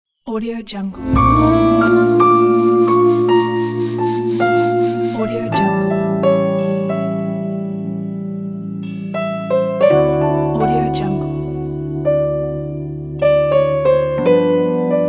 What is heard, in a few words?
piano logo